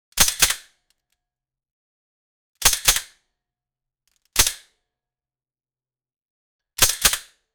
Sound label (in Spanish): sonido de disparo de un, rifle